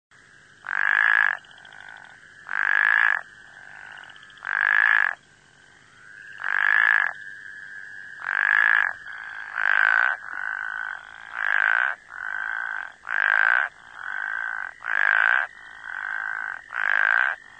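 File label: Spea bombifrons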